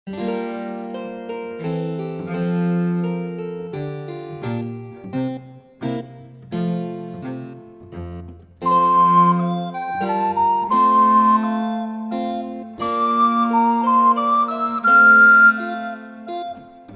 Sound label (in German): Menuett